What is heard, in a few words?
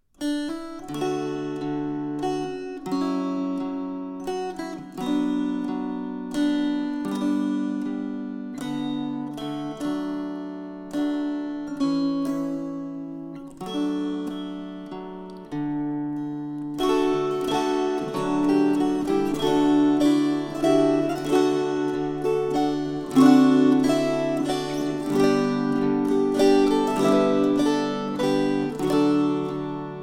String Choir